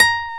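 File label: classical guitar, nylon string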